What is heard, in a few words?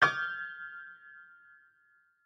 shock piano hit; Joe's Diner